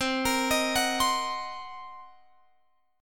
C Minor 7th Flat 5th Guitar Open F, chord; Cm7b5; arpeggiated

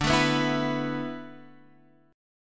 D 6th Suspended 2nd D, chord

